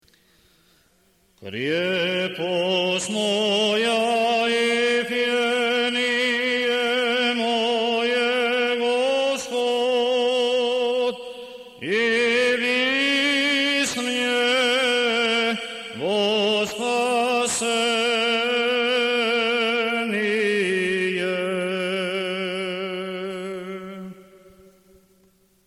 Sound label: Osmoglasnik II glas